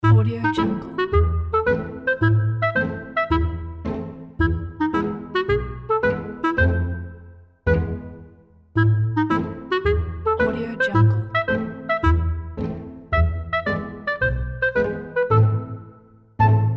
comic story; pianissimo-composer

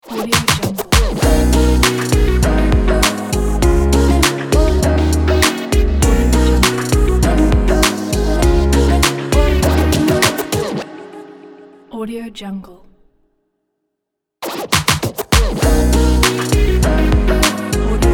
stylish vlog opener ident

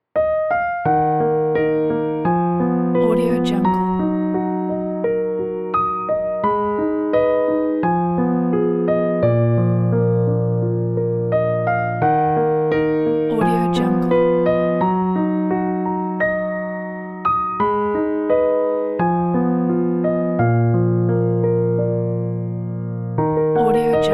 sad piano

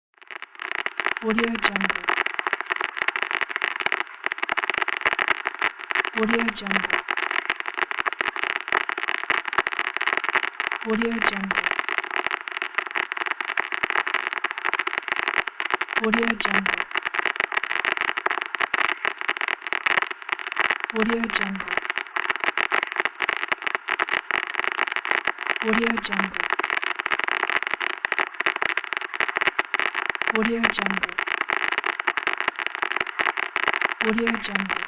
static

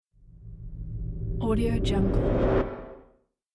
cinematic sound design

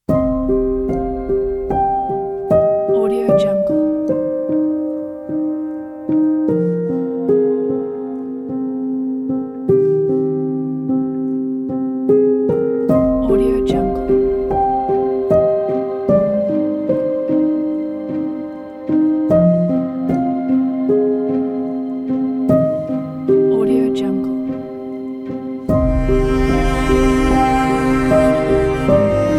sad music cello

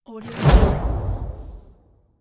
telekinetic push spell

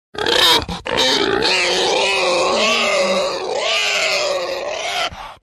pig

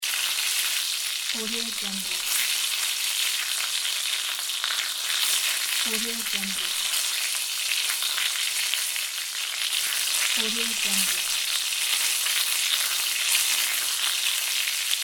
grilling steak loop

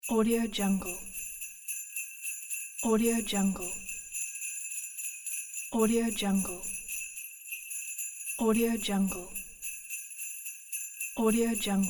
sleigh bells; cuttlefishm

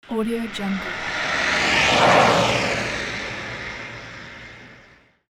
car pass by

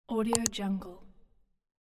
mouse click

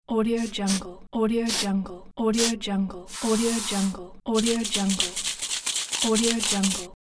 spray; dragospitaru

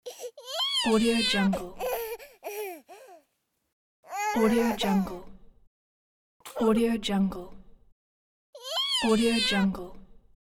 baby; teldrassil

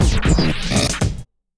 radio stinger

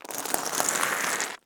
pasta shells slide

